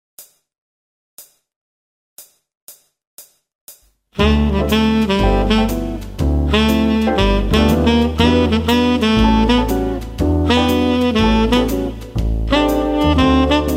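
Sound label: Jazz melody; MyVirtualBand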